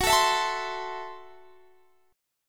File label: Gb Minor 7th Flat 5th, Guitar Standard; strummed; JGuitar